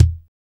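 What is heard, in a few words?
THUMPER kick; Drums In Your FACE!; POP-ROCK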